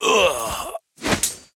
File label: Diraqipriest Death